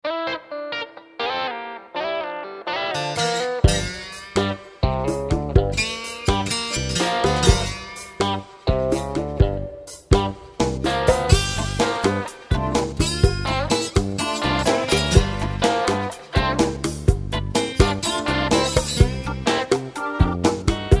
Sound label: Blues